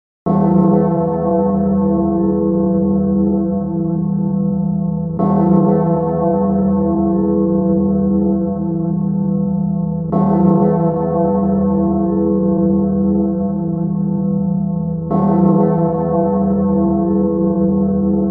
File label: Bell Boom